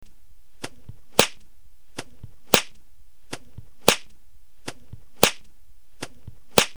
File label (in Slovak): bič zvuk; folklor